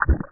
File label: Slosh; Cruelty Squad